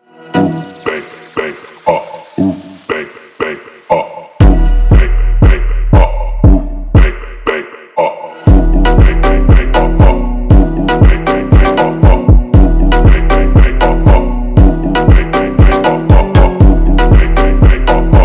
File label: slowed